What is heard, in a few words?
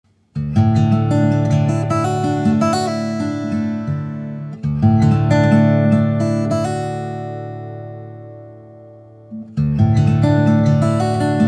Guitar; Vintage Prewar Guitars